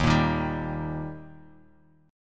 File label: Eb Major, down, Guitar, Open F; strummed